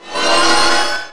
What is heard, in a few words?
POWER UP; Trickstyle